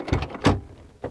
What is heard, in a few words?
door op; Mafie 1